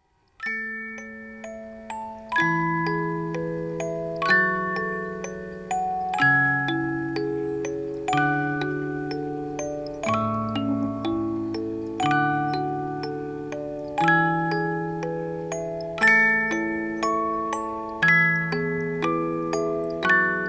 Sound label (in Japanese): canon yayayukkuri, musicboxes